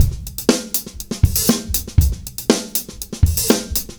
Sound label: Live Beats, R&B Drums